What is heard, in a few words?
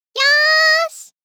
Dance; netent